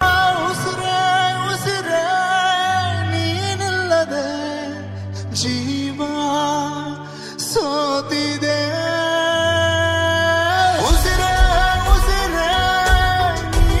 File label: Humming Kannada Ringtone